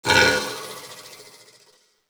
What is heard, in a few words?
wretch tunnels amb idle; A Machine for Pigs